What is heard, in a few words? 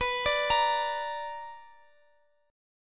B Minor Major 7th, Guitar, Drop D